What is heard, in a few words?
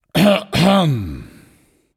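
non verbal clear throat, voice; cernunnos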